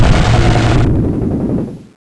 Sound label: c4 explode; furien